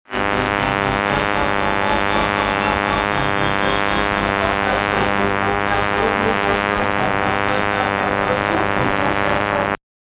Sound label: raw sounds